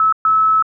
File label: Aircrafts Cockpits Beacon Near; DCS